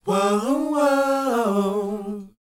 WHOA G#, Vocal R&B & Dance; ILIO; Vocal Planet

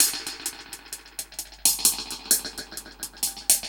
Drums A Hats Echo 130, dub drums; musicradar

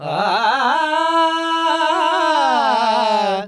Vocals Male World Pop; Sonic Foundry (Sony Creative Software)

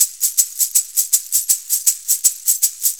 Shuffle Perc, Perc Loops; Sonic Foundry; Sony Creative Software; Loops & Samples Drum Tools; Shuffles

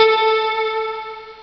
synth, Amiga Soundtracker Sample Packs

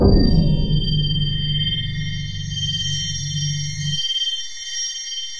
ICICLES R; NorthStar - The Wizard VOL-2; DRY ICE

